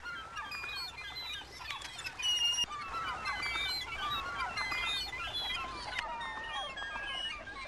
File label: seagulls; Aminet 23; GTI - Schatztruhe